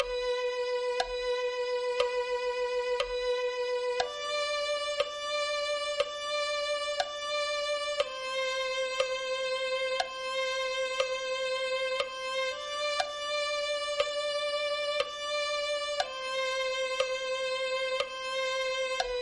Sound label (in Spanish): oh señor anhelo tu presencia violin